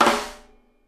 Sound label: SNARE, Live Snares